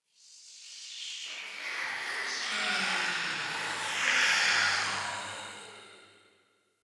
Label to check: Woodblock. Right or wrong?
Wrong